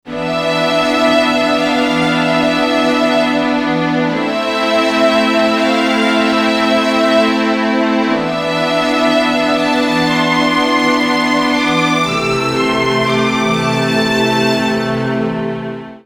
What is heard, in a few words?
Alesis Fusion Strings